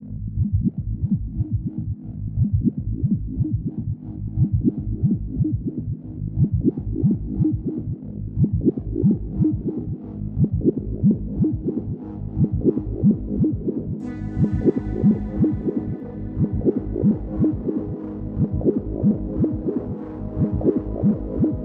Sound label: Minimal Electro Synths